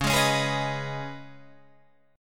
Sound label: C sharp 7th Suspended 2nd C sharp Guitar Open D; down; chord shape